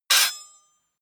hit metal sword small

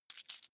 coin big drop soft

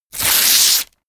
tear

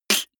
beatbox snare clap

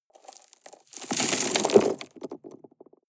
drop rock debris medium

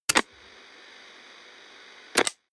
turn on off

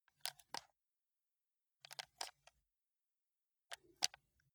console controller option press slow

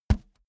balloon hit hand

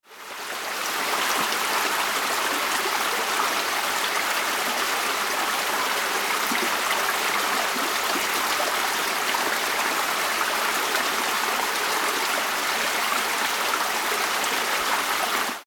stream medium

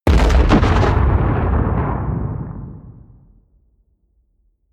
bomb rumble close

